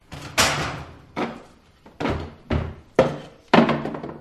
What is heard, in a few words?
pub wooden chair bang movement on tile floor foley; field reports